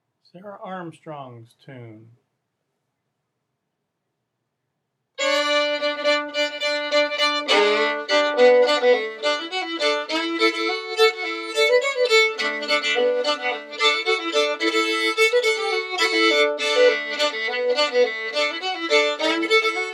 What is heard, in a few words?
fiddle; festivaltunes